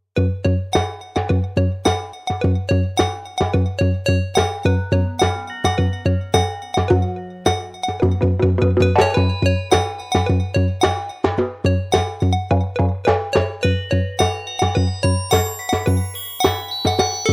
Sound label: trailer music